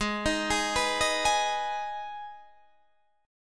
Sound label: Minor, Guitar, Half Step Down; G#m; Gsharp